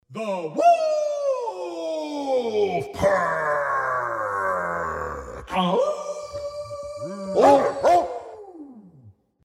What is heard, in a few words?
Wolfpack ringtone